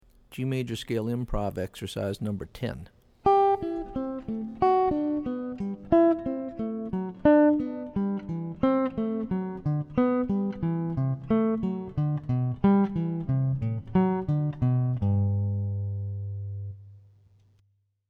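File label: G Major Scale Improv Exercises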